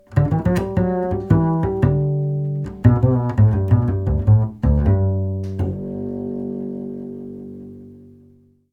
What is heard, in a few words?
Bas jingle